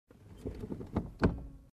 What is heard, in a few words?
Car driver seat backed off